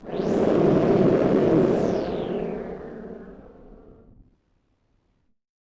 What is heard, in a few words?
Malfunction Synth, malfunctioning synth samples